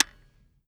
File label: fire disconnector; gmod; viper